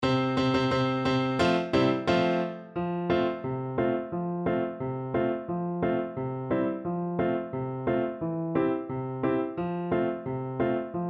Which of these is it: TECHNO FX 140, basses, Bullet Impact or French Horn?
French Horn